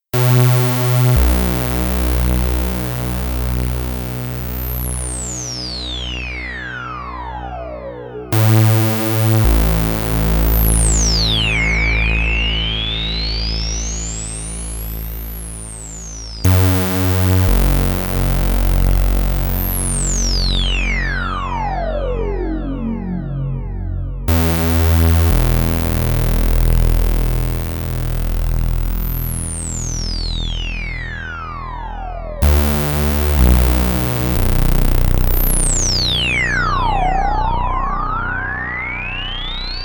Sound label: Filter Sweeps; GRP A2